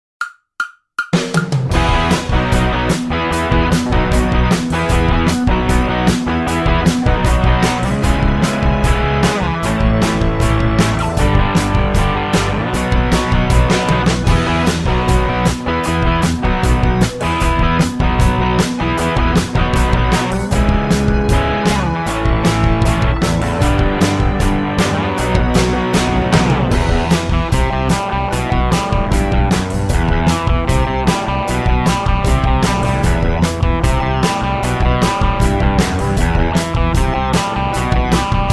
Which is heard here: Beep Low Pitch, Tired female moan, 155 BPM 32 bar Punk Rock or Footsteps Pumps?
155 BPM 32 bar Punk Rock